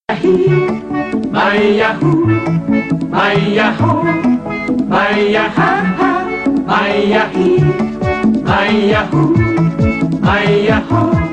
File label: Pop Sunda